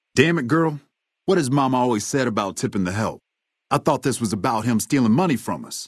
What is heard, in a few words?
laughter; FNV; vangraff